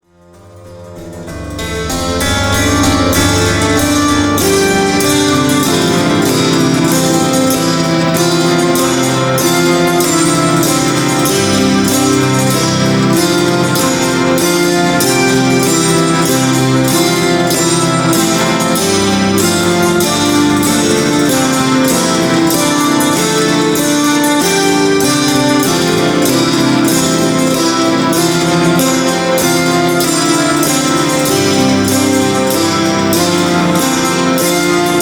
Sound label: Ukrainian Folk; Ukrainian Folk for ''Ten Desires''